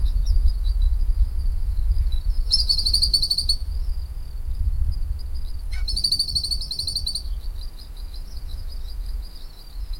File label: a mute swan hissing and